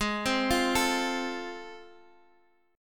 A Minor Major 7th Sharp 5th G sharp

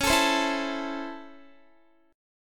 Db Minor Major 7th Sharp 5th Db down Guitar; strummed

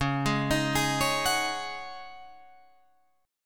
Db Suspended 2nd Suspended 4th Db Guitar Drop D

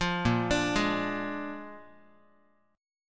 A sharp 7th A sharp, Guitar; A#7; arpeggiated; 8,6,5,6,x,x

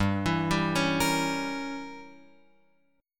G-Diminished 7th-G-down-Guitar-Open F; arpeggiated; JGuitar

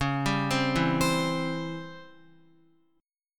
Db Minor Major 7th Flat 5th Db Guitar, chord; DbmM7b5 arpeggiated; JGuitar

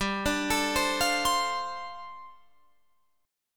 G 7th Suspended 2nd Suspended 4th, Guitar Drop D

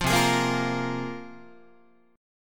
C 7th Suspended 4th Sharp 5th, Guitar; C7sus4#5 strummed; C7sus4#5 chord

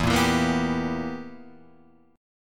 B Major 7th Suspended 4th Sharp 5th E

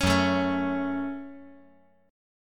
F sharp Minor, guitar

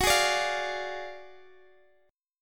D Diminished F sharp; Ddim/F#

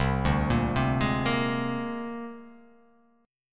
C 7th Suspended 2nd Sharp 5th, C7sus2#5 chord; C7sus2#5 arpeggiated; JGuitar

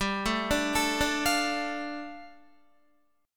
G 7th Suspended 2nd, Guitar; G7sus2 arpeggiated

